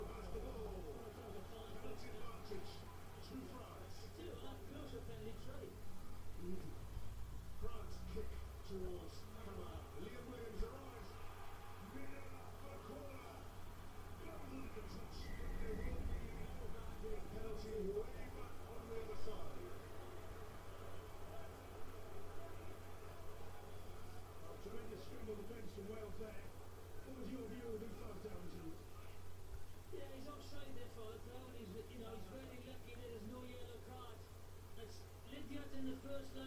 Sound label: Living Room Ambience Rugby